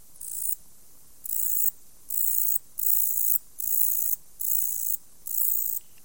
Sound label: Atlanticus monticola, Arthropoda